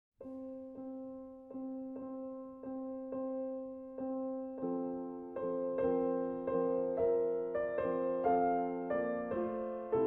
Ballade F Dur; Chopin; op.38

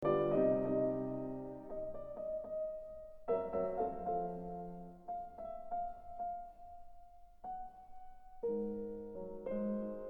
Variations Brillantes In B Major; Op. 12

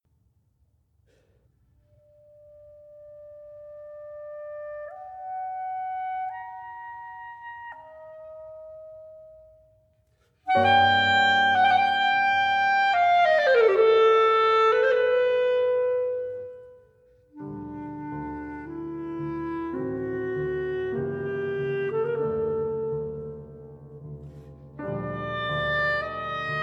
Romanza

